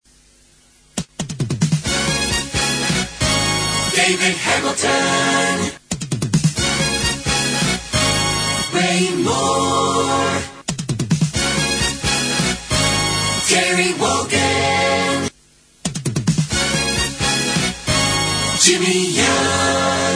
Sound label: jingle